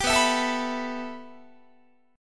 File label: B 7th Suspended 4th Sharp 5th B down Guitar; B7sus4#5; strummed